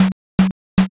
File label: knock